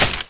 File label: generic, impact debris; SiN